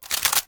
reload laser; ufoai; weapons

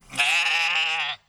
lamb

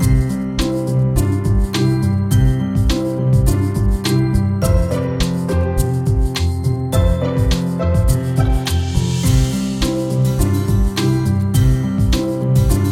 pleasant creek loop; stendhal

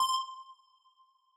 ufo lost; geoscape

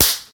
attack melee

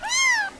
kitten meow

